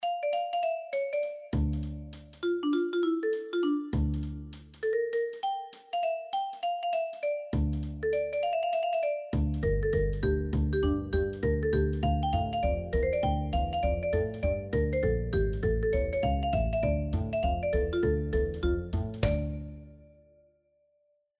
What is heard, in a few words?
Comics Music